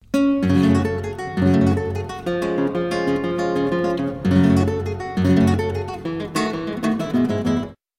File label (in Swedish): klassisk musik